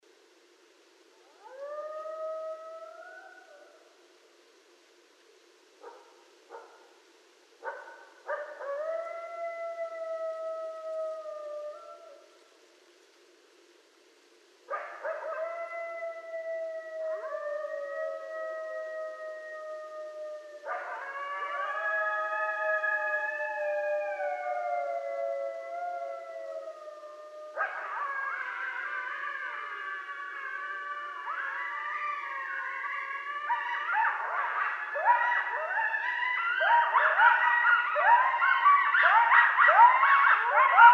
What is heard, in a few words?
Coyotes; Coyotes (Canis latrans); night sounds